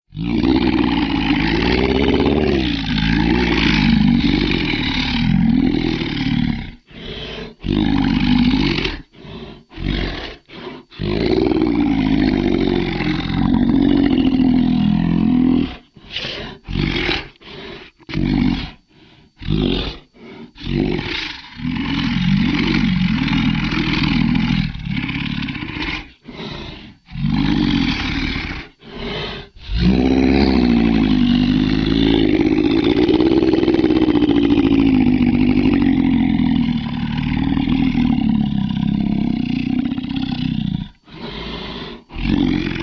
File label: Monster Long Growls